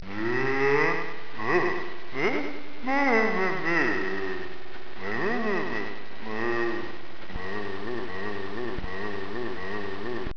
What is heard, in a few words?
moo, guy; hall of moos